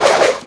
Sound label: Tap Tricks